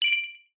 multimedia game tone sci fi futuristic beep high pitched